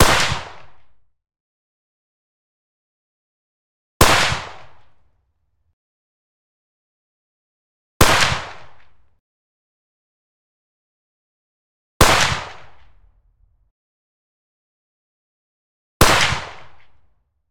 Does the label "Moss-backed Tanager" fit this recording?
No